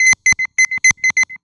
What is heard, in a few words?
Tone, IDM; Sonic Foundry (Sony Creative Software); Twine-IDM